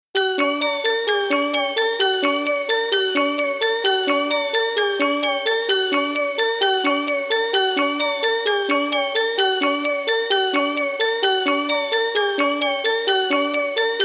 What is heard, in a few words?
PLUCK